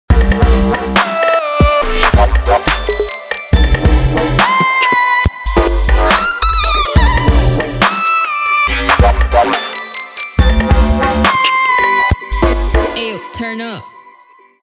dubstep

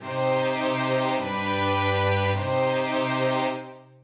cadence authentic minor

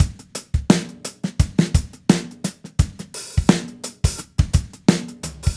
4 4 swing

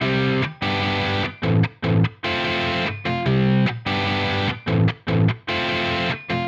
punk power

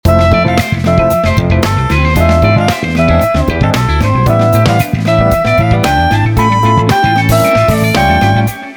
funk vi7

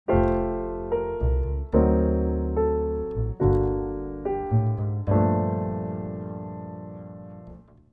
voicing star drop

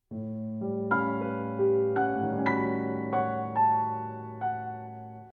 V mystic sonata

